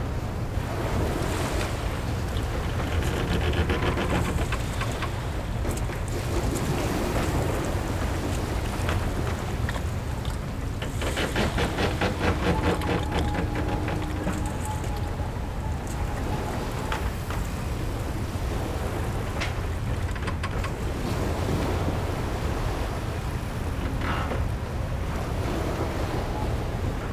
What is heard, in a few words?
Amb Port; bloom minigame